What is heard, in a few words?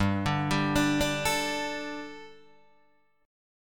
G Suspended 2nd G, down, Guitar, DADGAD